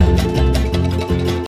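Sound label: ocean world music cage forcefield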